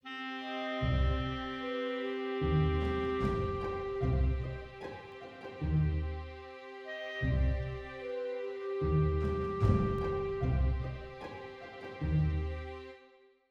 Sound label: exploration, Orchestral Background Music, epic battle intro; Epic Adventure